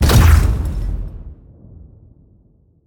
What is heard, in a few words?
robot explosion; fight